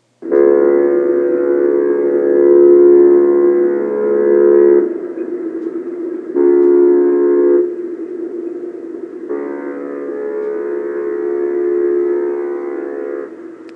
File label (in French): Sirene de Paquebot